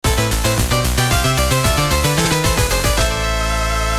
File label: Stage Clear; Akumajou Densetsu